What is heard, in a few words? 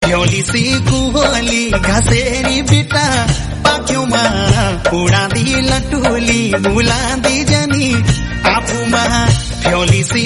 Garhwali Song Ringtone